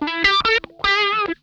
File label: RASP LICK, Funk Guitar; 90 s Sample CDs; Zero G